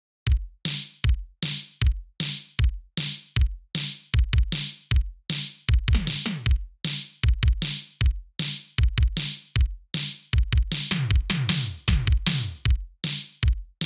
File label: drums main, 155 BPM; The song is in 155 BPM